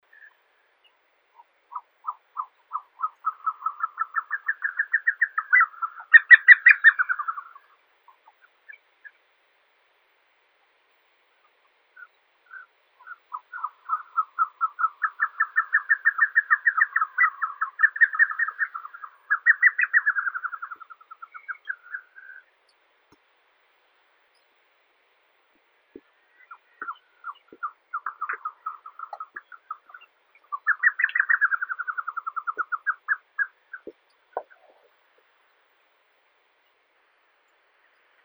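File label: Caucasian snowcock, Tetraogallus caucasicus; ular kavkazskii; zvuki prirodi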